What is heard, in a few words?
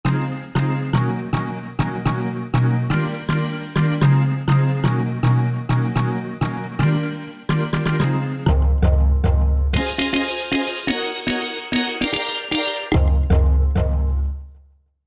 Chipper Hit